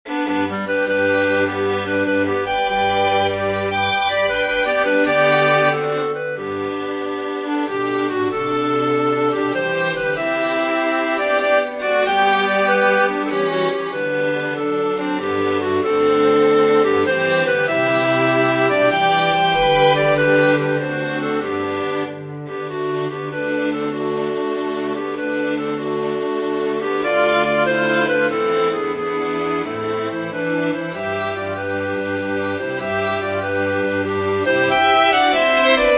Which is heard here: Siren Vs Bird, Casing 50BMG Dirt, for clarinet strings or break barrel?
for clarinet strings